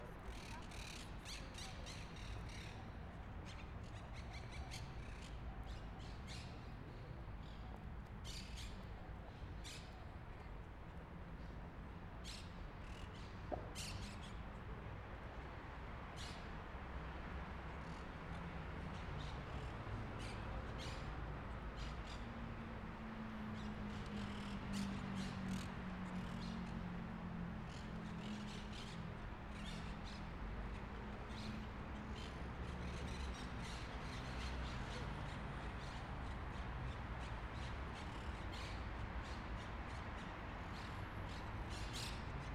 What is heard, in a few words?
Madrid Birds Outside the Prado; natural sounds